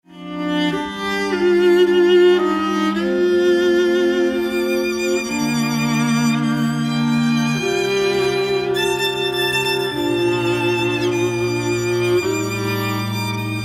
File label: Live String Quartet